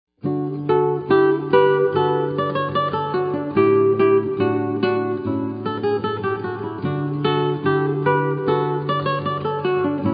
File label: classical guitar